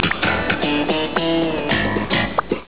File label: Bass riff